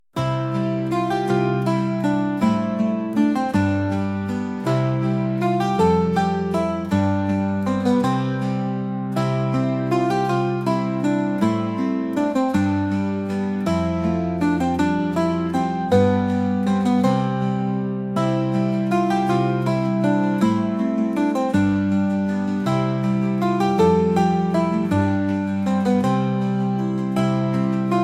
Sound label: calm